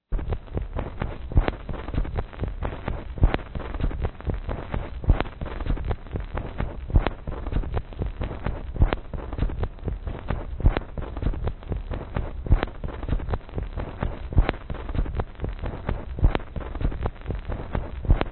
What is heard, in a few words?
groove; Groove Edits (vinyl record grooves cut onto CD disks); Absolute Value of Noise; Transducers, Contact Mics and Micro-Audio